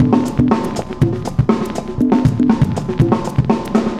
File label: cooling 120, loops, 120bpm